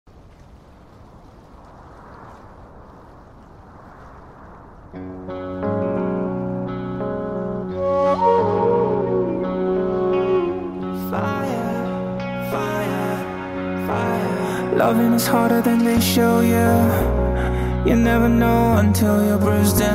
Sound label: Acoustic Version